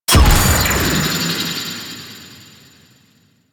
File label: Medusa Button Activate; Sentient; Warframe; The New War; Sentient Devourer